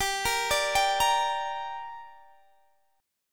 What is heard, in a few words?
G Minor G down Guitar Open D, chord